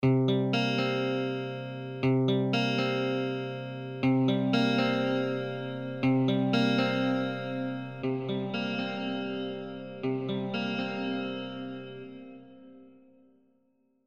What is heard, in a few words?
Lexicon Concert Hall Strat